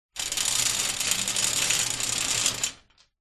industrial chain small movement scrape hoist over metal light room reverb; zapsplat